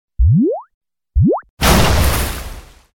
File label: attack; hero